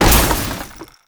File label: Military Crate Destroy; Objects; Storage Container; Warframe